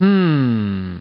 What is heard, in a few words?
humm; BattleSNDS